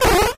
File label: squawk; Dino Run